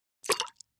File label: Splash Sml Plop Drink